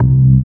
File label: dance bass; Sound & Vision - Gigapack II CD 1